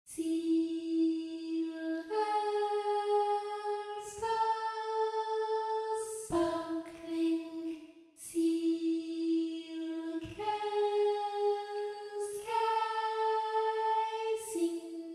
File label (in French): pont soprano 1x alto plus 2x sop